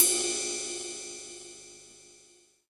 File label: Ride, Drum & Bass, Hats & Rides; AMG - Prototype Drum & Bass